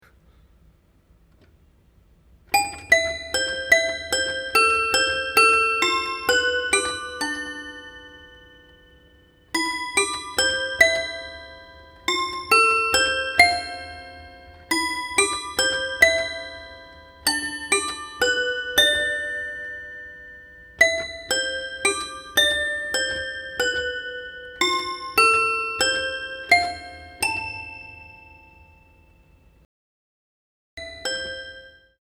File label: Toy Piano